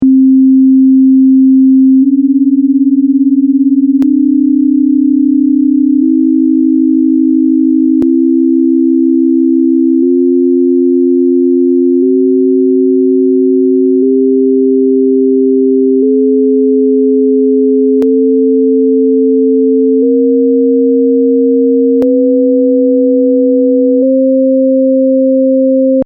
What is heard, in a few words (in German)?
sinus konsonanz